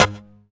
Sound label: SYN, DANCE SYNTH